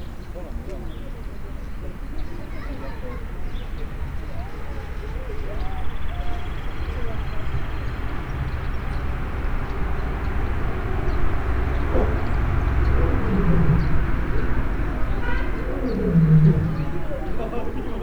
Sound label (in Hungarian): oroszlán; állathangok; nyíregyházi zoo 2010